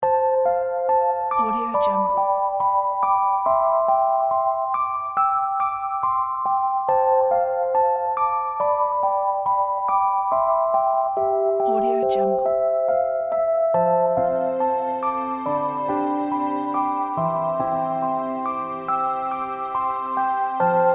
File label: sweet and sad